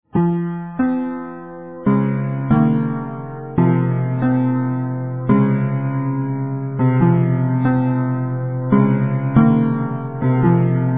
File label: tape pianos